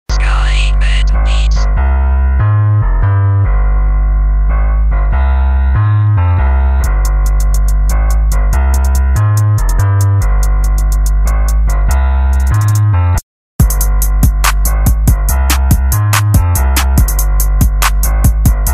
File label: instrumental